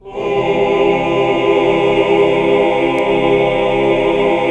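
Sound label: chord 2 8 L; Best Service Gigapack 1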